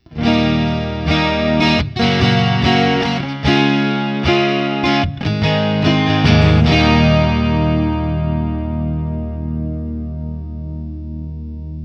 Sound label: Crossroads Doubleneck 12 ODS100 Open, Open Chords; Guild Slash Crossroads Doubleneck